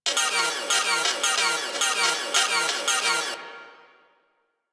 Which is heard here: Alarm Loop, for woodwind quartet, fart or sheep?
Alarm Loop